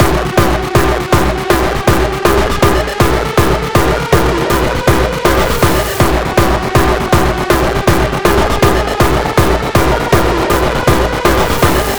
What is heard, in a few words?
Beats Loop; Angerfoot Beats 11